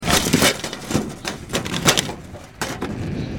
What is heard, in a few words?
Slide Tone